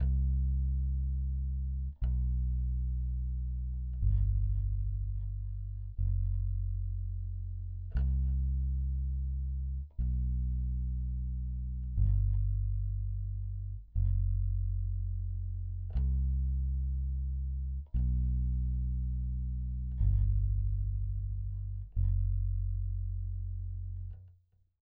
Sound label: bass